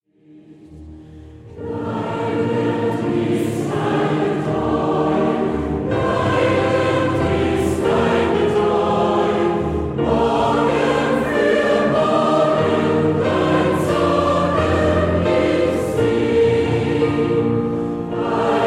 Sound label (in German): bleibend ist deine treu unvergessen chor